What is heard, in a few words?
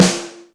SNR ROM; Back In Time Records - Big Bang